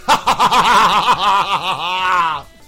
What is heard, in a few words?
laugh